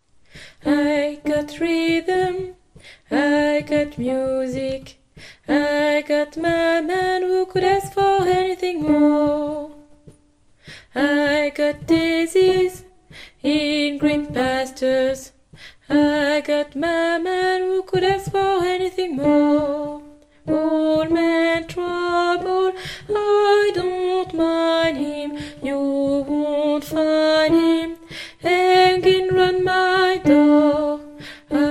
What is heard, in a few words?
alto